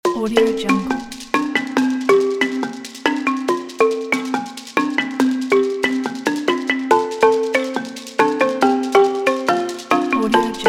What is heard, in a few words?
light hearted happy african music background